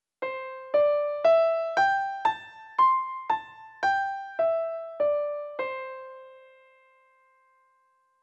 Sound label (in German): C-Dur Pentatonik Grund-Modus